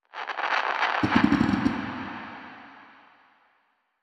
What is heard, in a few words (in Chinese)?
诡异森林氛围声; ambient